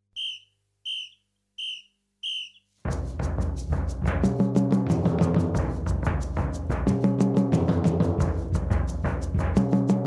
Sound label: groove